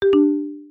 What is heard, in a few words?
member leave